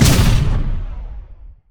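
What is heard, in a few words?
tryndamere bloodlust; LoL; demon sword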